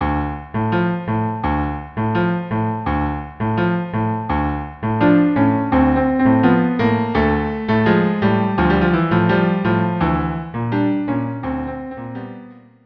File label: habanera cello duo; carmen